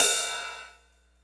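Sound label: ride, Hardcore Breakbeat, 159 F major; Big Fish Audio; Hardcore Breakbeat Action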